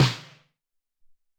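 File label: SR16 Snare, Alesis SR-18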